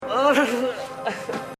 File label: uhhuhuhhuhahhuh